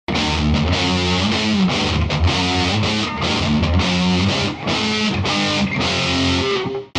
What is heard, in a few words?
badcat double gain